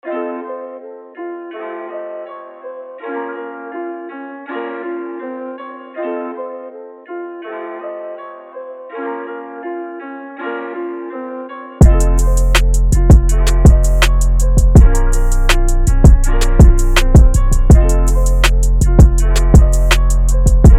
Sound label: Drum Kit; Kyle Stemberger; Formula